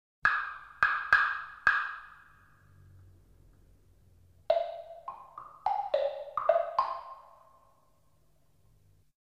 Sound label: Woodblock